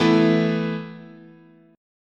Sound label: E Suspended 4th, chord